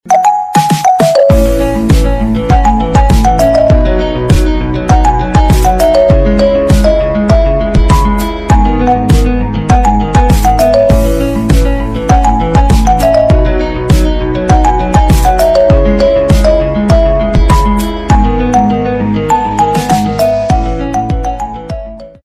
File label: Marimba